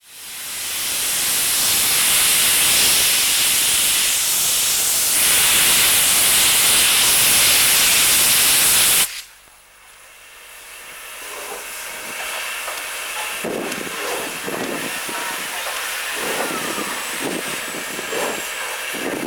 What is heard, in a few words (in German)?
dampflok sound china